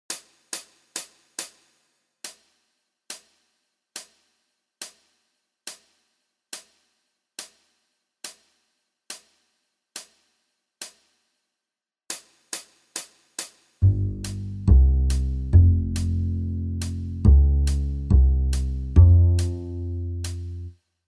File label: Jazz backing; Guitar Techniques 2019 Issue 296 June